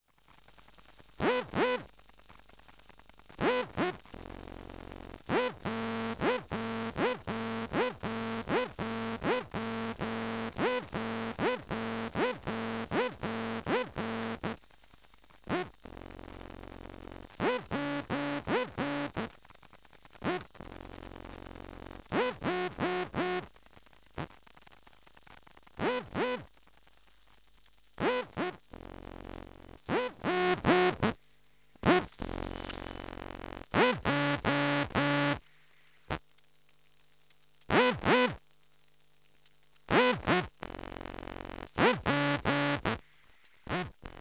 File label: Printer sounds; EM Pickup